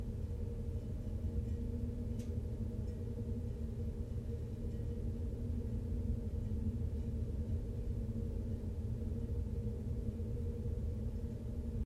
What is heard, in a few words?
Internal Ambience Loop; horror and atmosphere fx; Silent Hill Downpour